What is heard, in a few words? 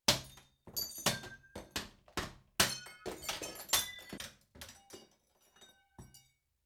Housebreaking, Front Door Glass, Power Tools and Construction